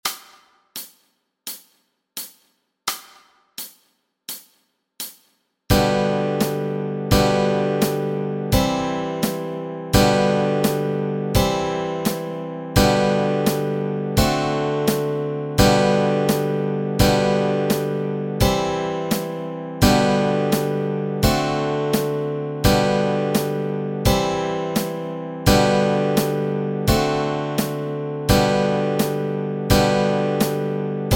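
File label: chords, Easy Guitar and Ukulele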